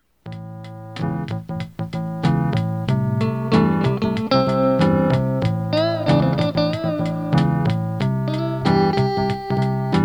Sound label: relaxing